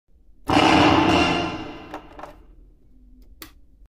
korku sesi; Birilerini korkutmak için korku sesi

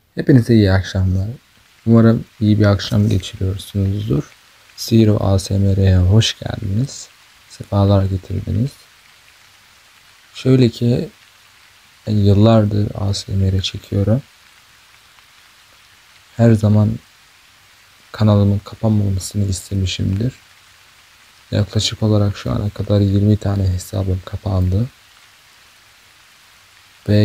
Erkek Inleme Sesi

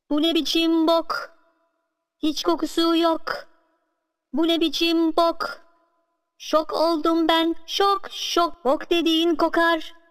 osuruk sesi

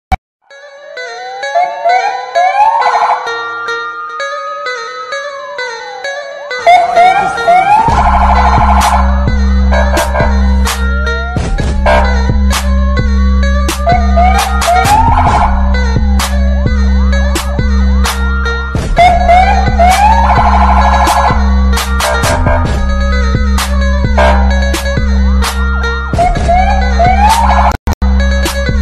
konvoy siren sesi remix; sedat peker ve dev ordusu

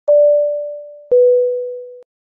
ucak kabin ikaz sesi

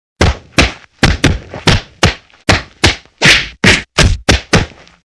yumruk coklu yumruk dovus ses efekti

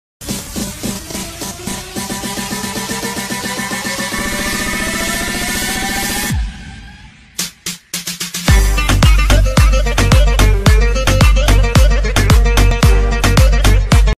telefon zil sesi trap beat; trapmusic

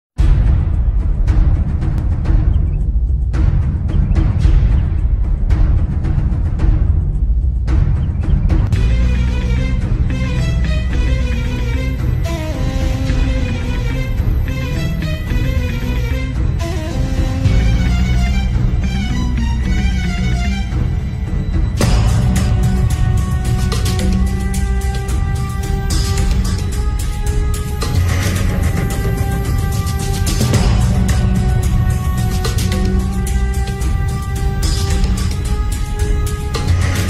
heyecan fon muzigi; sefkat tepe

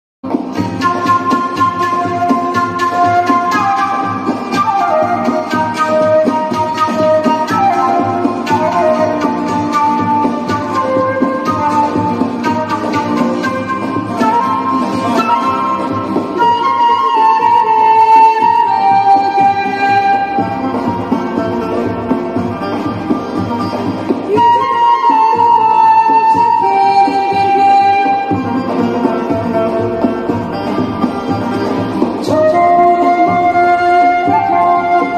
flut

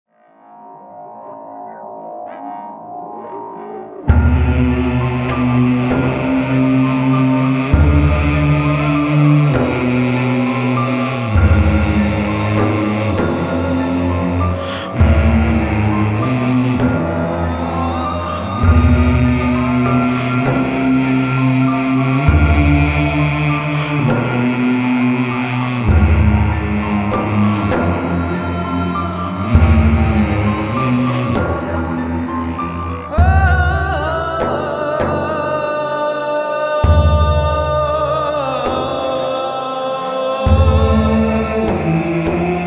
dizi muzikleri; tunelin sonu; arka sokaklar

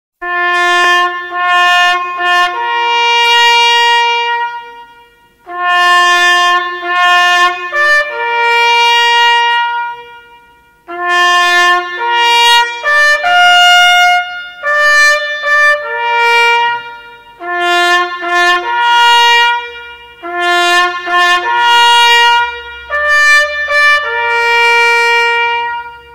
saygi durusu ti sesi

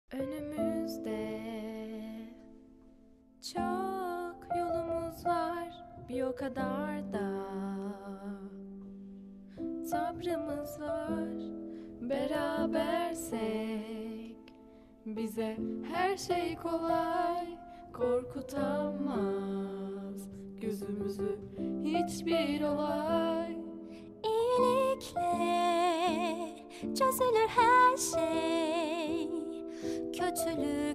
slow versiyon karaoke

